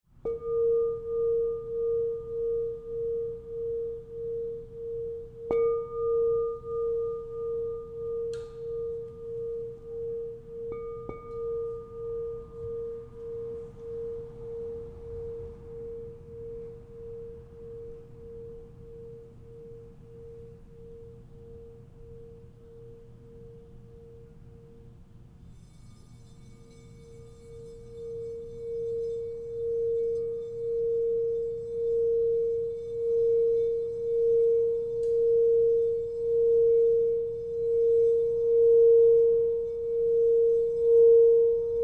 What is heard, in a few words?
Crystal Tones Singing Bowls